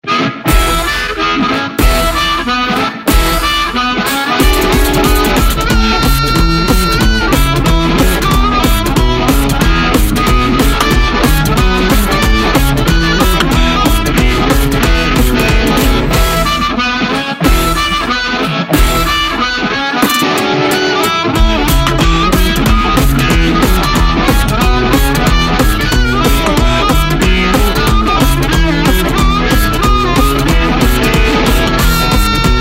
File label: Harp Boogie, Blues; Jazz